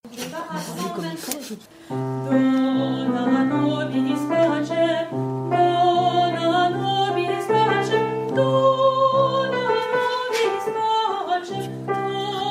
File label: alto; agnus dei